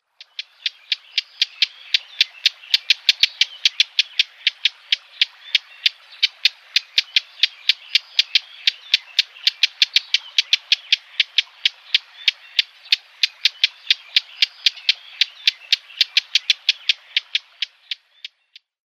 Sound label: Lesser Whitethroat calls